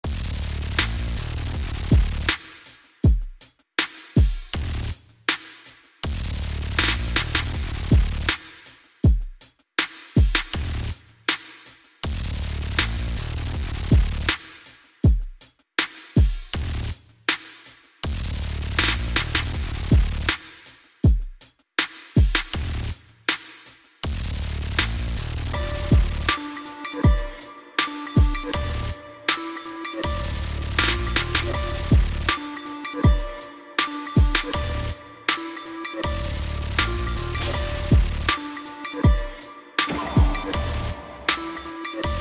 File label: Big Baddabass